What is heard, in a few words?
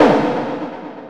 hit, sfx; anvil